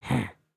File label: Idle Bored, creatures organic; Lego; Strombie